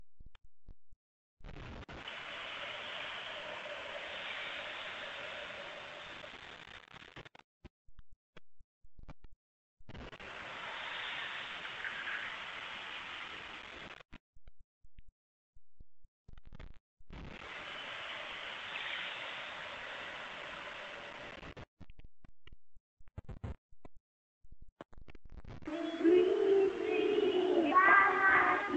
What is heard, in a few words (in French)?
chanson pluie par chorale ecole